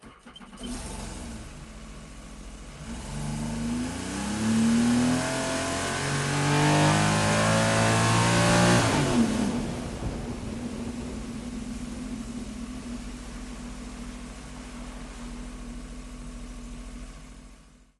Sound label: jet ski pwc sea doo extra startup to full speed to off quickly; sonniss gdc